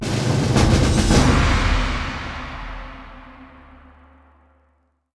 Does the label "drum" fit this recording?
Yes